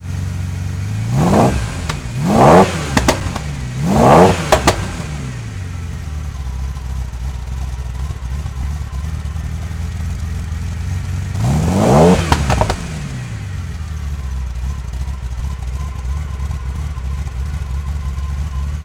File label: Ferrari Testarosa Revs; Automobiles